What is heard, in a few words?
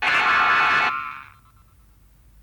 roar; jet jaguar 1973